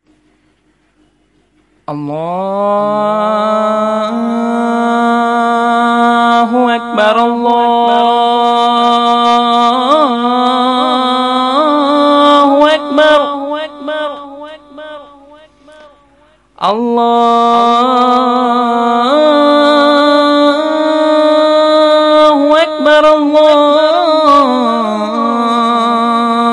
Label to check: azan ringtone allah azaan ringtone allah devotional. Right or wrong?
Right